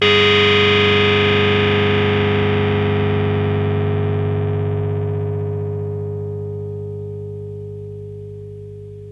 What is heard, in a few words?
REZ RHODES